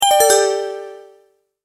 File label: Multimedia Tone-Return/Back; Sound Design; McKinney Sound